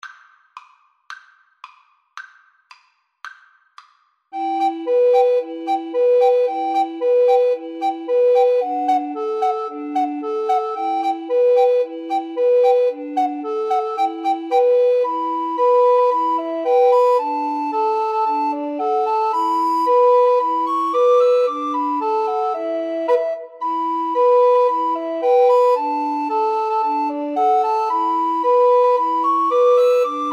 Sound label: drunken sailor, Recorder Trio